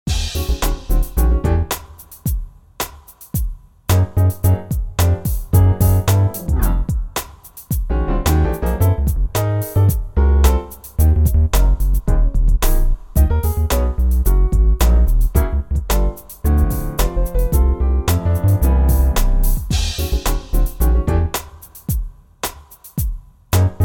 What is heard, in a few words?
main music; RS Games Sounds